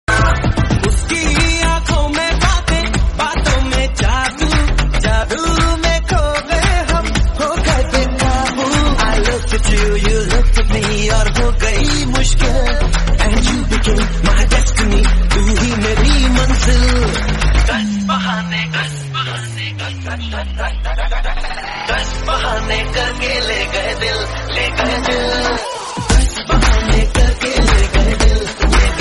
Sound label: bollywood song tone ringtone